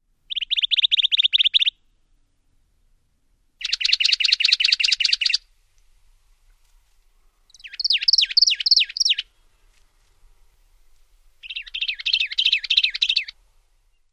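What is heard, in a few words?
Juniper Titmouse, songs number 1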